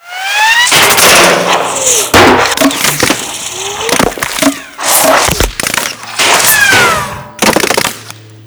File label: Hedge Impacts